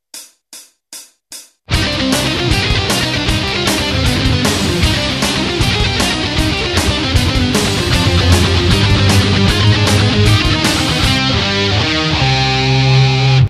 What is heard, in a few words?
neo classical